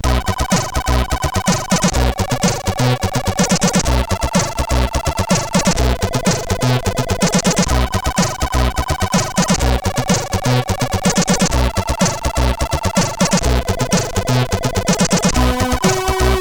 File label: Echo Effect, sid CSG8580